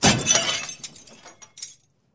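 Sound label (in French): Casser les phares, Accidents voitures; Accidents voitrues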